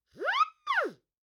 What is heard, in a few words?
acme whistle; prosoundfx